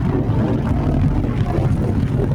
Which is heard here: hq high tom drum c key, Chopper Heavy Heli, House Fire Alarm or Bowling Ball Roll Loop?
Bowling Ball Roll Loop